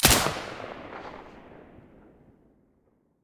silencer stereo, weapons

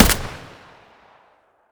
ash12 silencer mono distant, weapons; STALKER; IWP

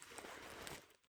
torch use; STALKER; IWP; item usage

